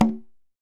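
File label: perc tumba, deep congas; Roland LCDP14 Africa VOL-2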